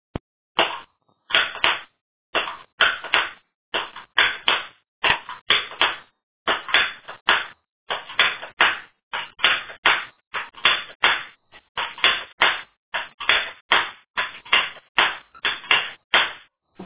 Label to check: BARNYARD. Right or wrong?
Wrong